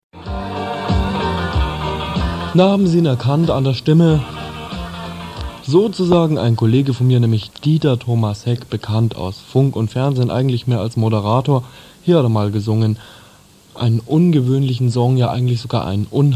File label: softrock